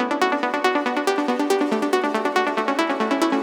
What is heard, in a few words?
140BPM B; Vengeance - Essential Clubsounds Vol.4